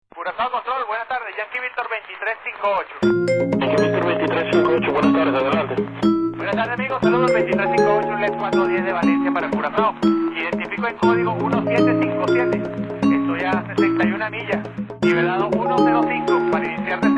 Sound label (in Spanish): Xilofono salsero interludio